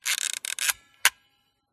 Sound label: smena symbol camera wind film lever; mkh8060; pmsfx